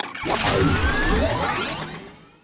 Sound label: jetski; giants